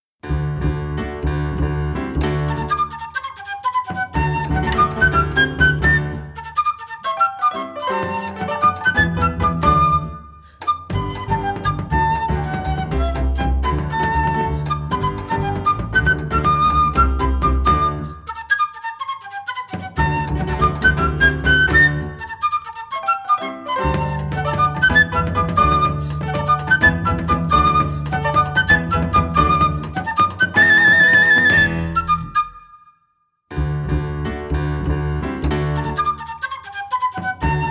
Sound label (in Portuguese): baião